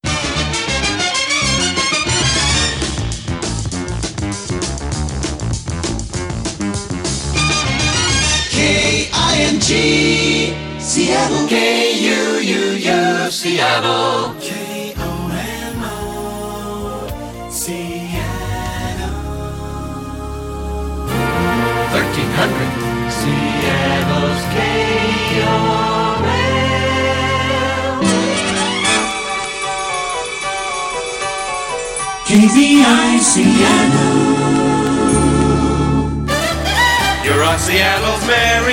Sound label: jingles